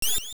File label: Teleport; Pairodd teleporting; zelda